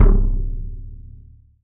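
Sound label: RESONATING KICK; 3D Drums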